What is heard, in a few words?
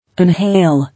Inhale